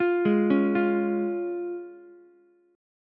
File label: Db Major, Ukulele; arpeggiated; JGuitar